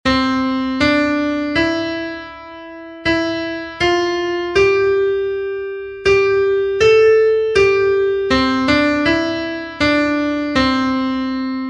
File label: melody